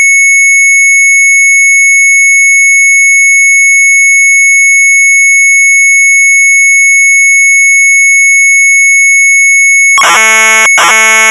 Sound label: MZ800 Tape; sharp; ASTROLOGIE; retromelsarchive